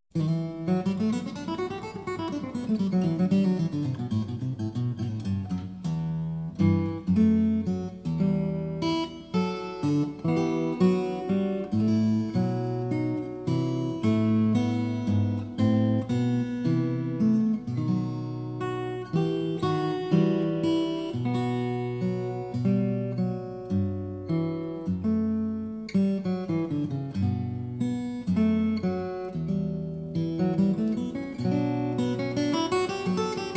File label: steel string guitar; Recercar 1; The Siena Manuscript